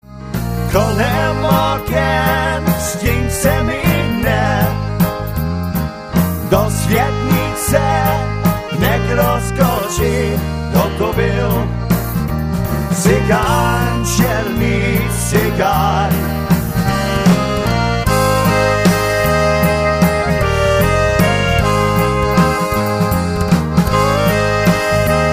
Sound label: Waltz